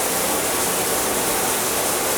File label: Shower Constant; Bathroom